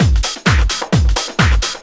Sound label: drum 130 tribal, drumloops; Techno Trance Essentials